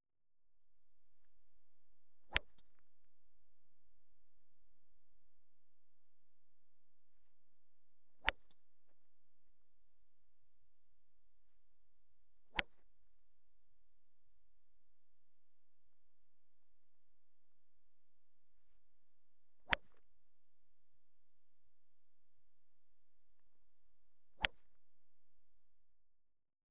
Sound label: Golf - 5 driving shots; The BBC Sound Effects Library; Sport and Leisure